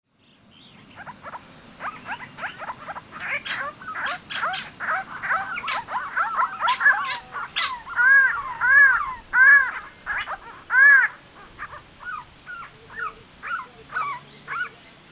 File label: Pomatostomus Temporalis; Grey-Crowned Babbler; Pomatostomidae (Australo-Papuan Babblers)